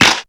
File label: rich top end steel snare drum sample a sharp key